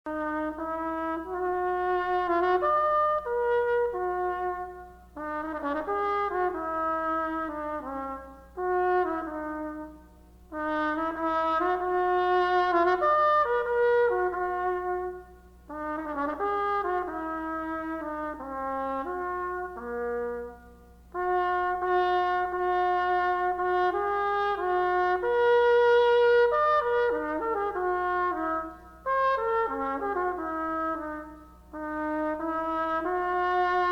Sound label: trumpet theme